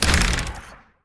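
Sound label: mech crossbow end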